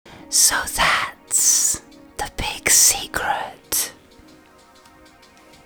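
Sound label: new vocals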